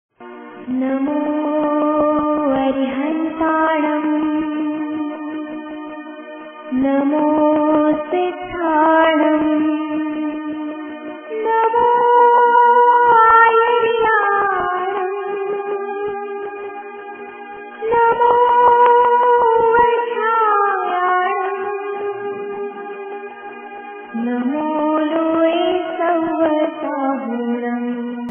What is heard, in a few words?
navkar mantra